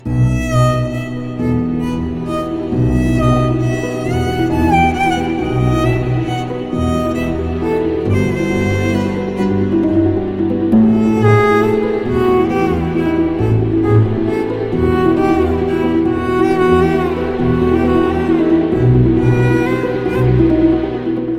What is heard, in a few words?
Violin Tone